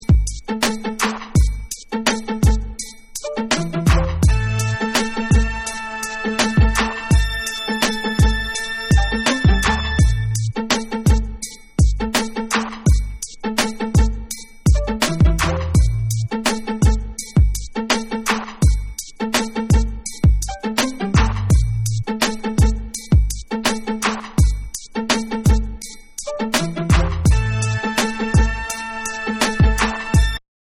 Instrumental

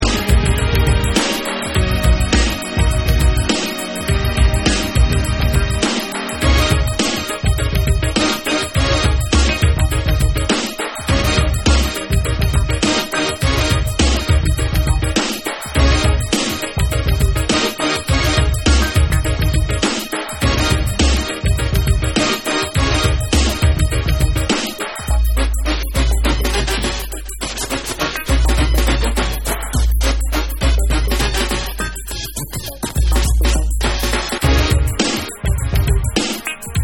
Instrumental Remix

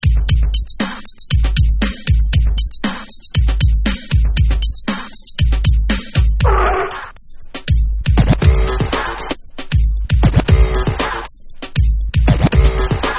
Dub Scratch

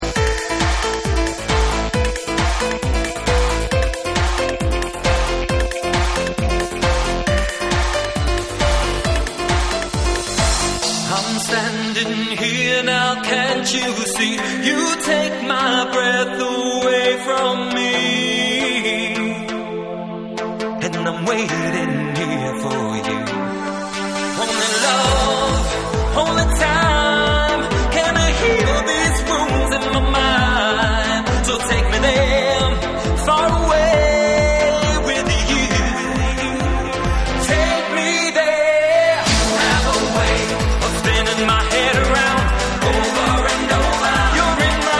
Vocal Trance Mix

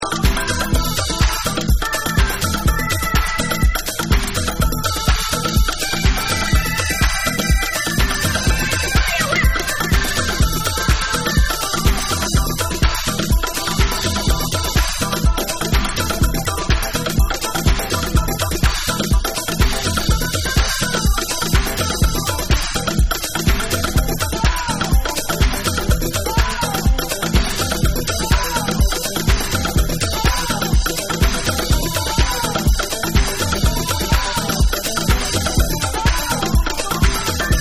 Tribal Groove

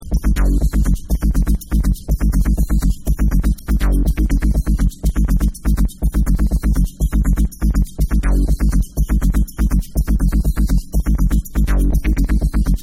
BONGO Mix Electrique

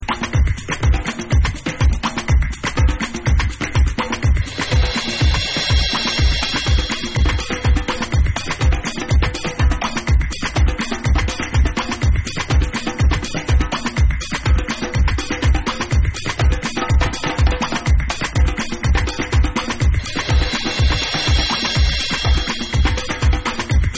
Afro Journey Beats

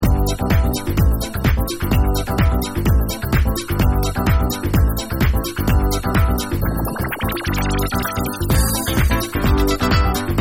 Black Latin Soul Dub

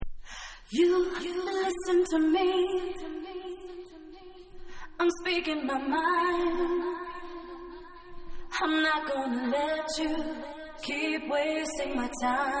Acapella

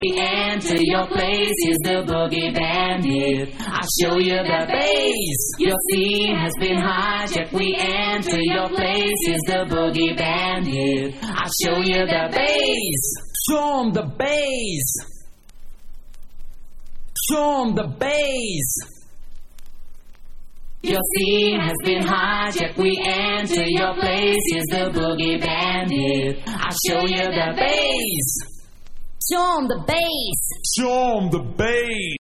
Acapella

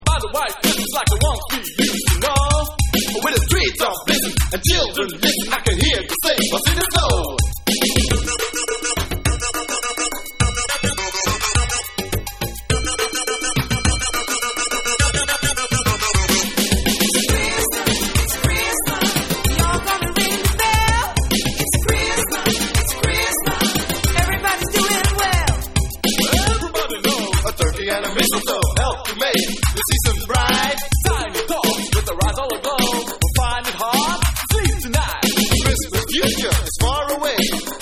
RAP

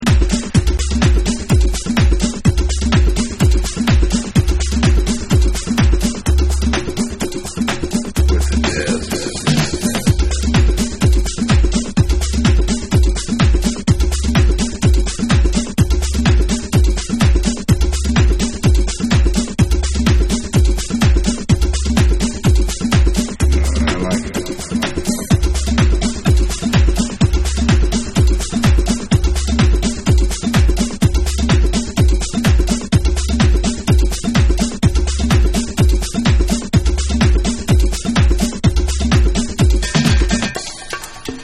MESSENGER Beats

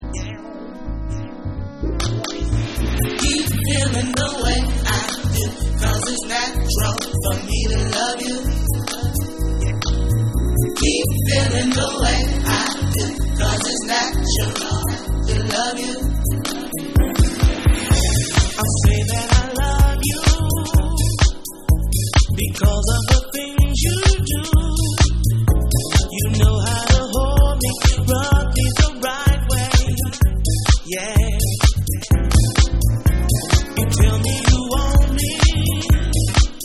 Big Room Dub

